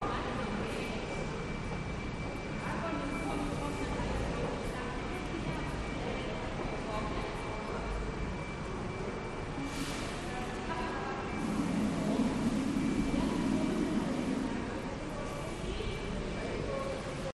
glitched tones bus station ambience newcastle upon tyne uk